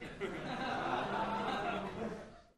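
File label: Crowd 100 Short Light Burst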